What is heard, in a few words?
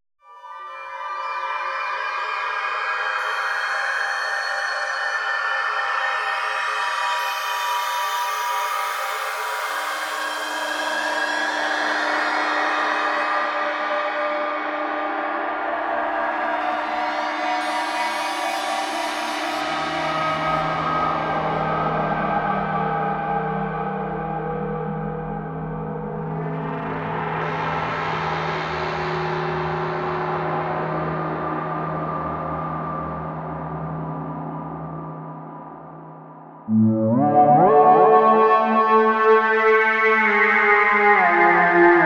soundscapes and drones